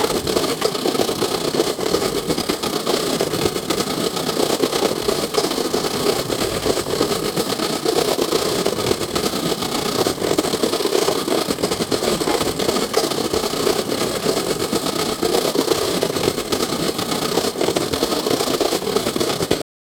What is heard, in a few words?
steam engine